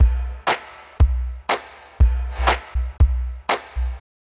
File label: Slap Drums 120BPM; Crowdsourced Sample Pack; Drum Kit; Drum Loops